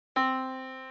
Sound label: yamaha Disk